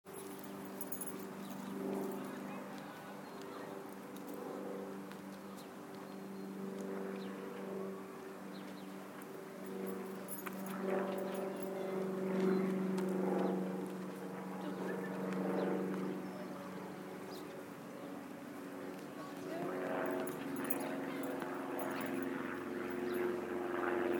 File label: plane and birds